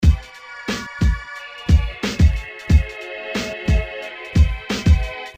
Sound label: neat beat